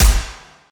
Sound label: Pistol Fire Longer Tail; Robobeat